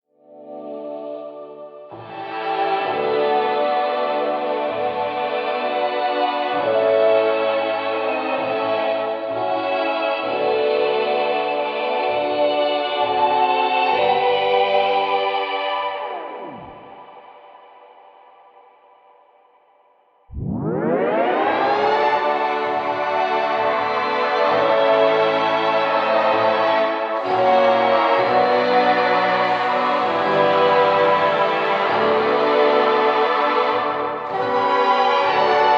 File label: Kiss Music; Eleanor; Warframe; Nineteen Ninety Nine; Quests; Romance NYE